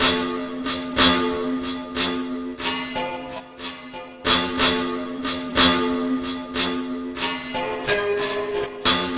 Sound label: Plucked String; Sonic Foundry (Sony Creative Software); Loop Noir